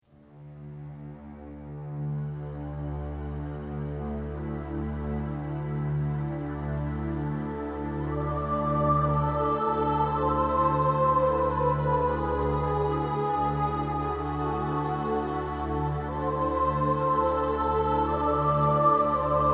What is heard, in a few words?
jingle; Hamburg